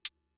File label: closed hats, Fricke MFB-522